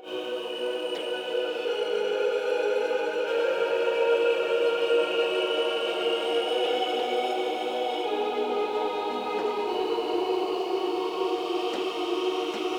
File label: pad children's voices; octane